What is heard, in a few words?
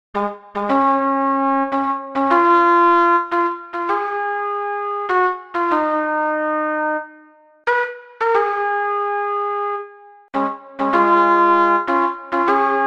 Flugel horn